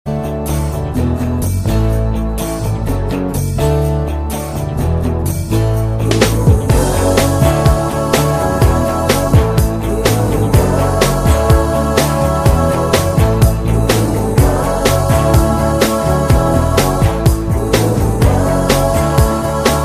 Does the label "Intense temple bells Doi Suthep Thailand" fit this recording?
No